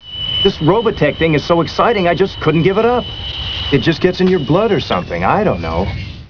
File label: FOKKER